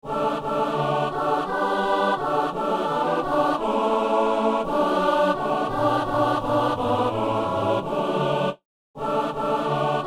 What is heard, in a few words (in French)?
messe alleluia; messe de saint boniface